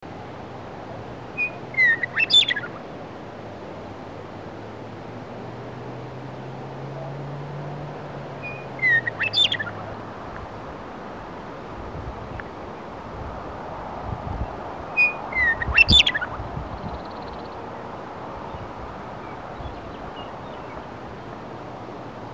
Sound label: Western Meadowlark